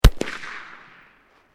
Fireworks Skyrocket Bang Close Up Isolated, Domestic Fireworks